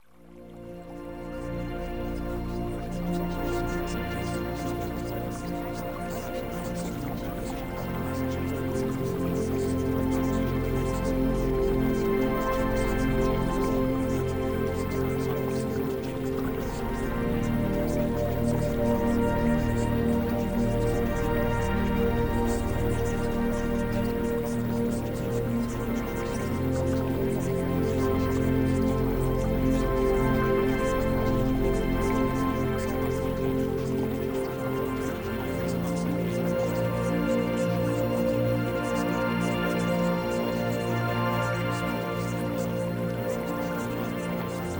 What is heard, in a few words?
Music; AUTHENTIC SELF